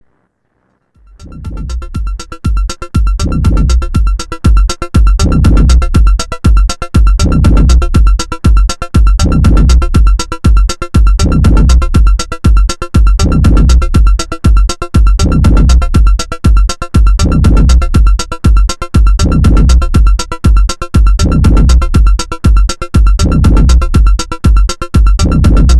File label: womp womp